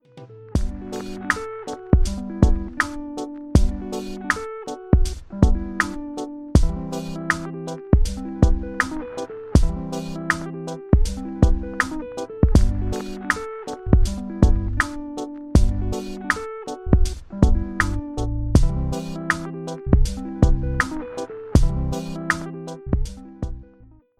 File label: lofi reggae beat ringtone